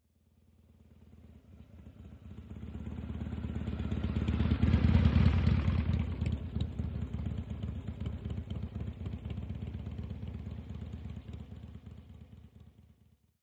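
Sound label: Motorcycle Harley By Very; harley-davidson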